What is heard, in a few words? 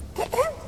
clear throat; CosmicRage; socials